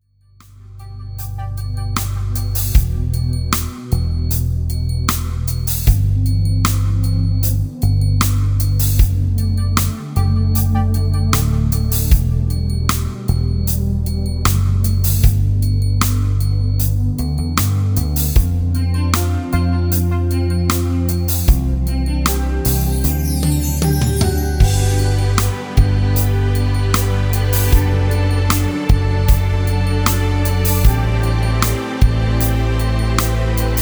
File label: rhythm